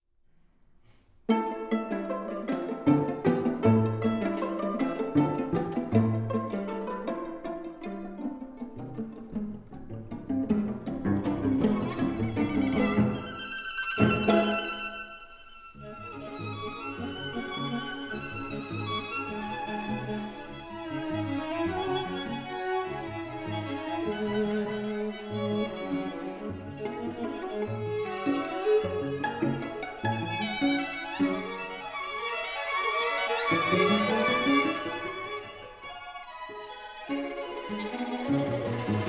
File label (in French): Ravel Quatuor en fa majeur Assez vif Très rythmé